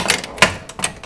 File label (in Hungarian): golyo beules; Pure Pinball